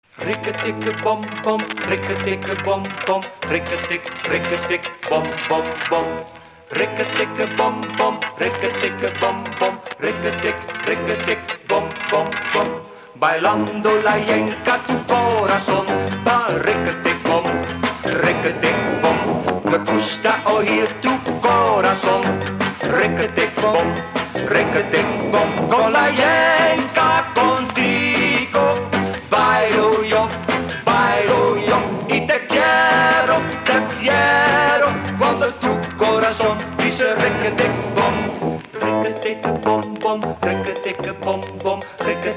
Yenka

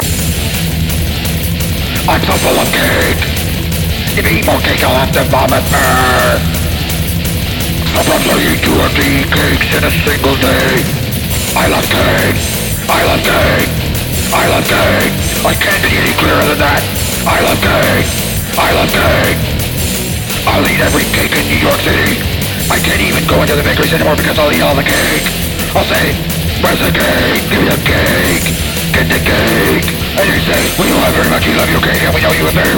death metal; covers

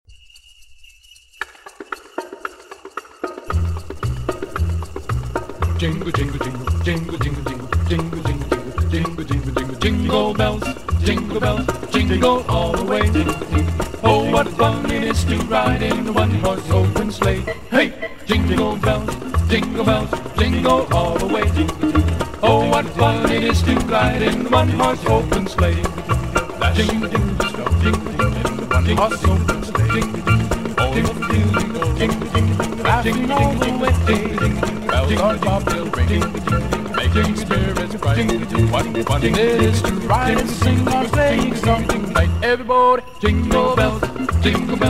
Jingle Bells; Special Ed Xmas

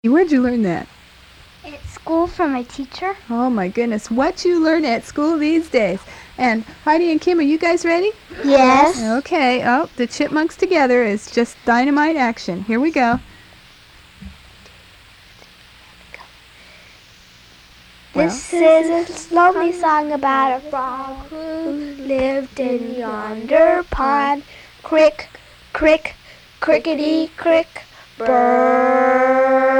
Frog Song

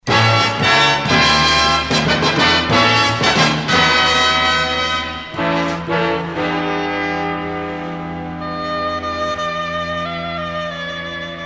Ad Music

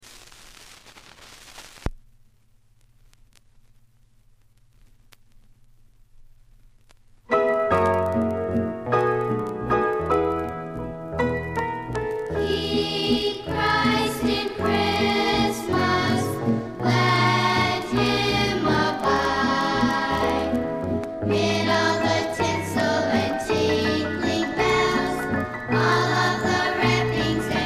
Choir

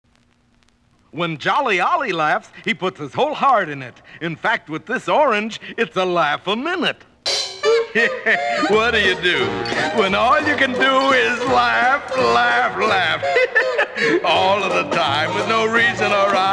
Laugh Laugh Laugh; Olly Orange and The Funny Face Gang

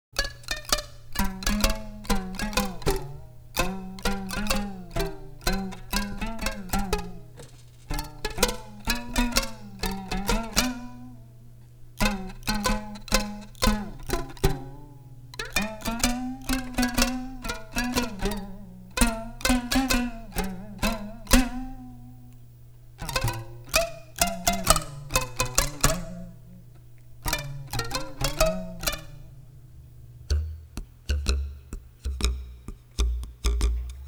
Rubber Bell Rock

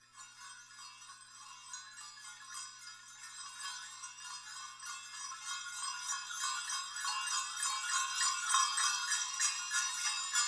Jingle Bells; Special Ed Xmas

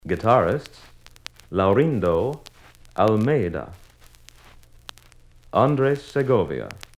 Guitarists

